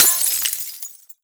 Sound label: break glass phys small; physics; breaking